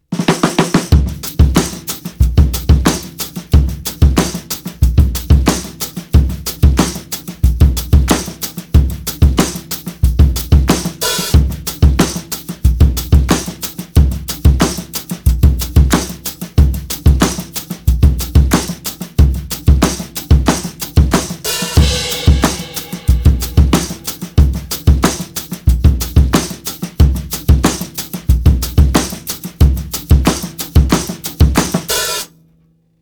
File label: groovy 92 bpm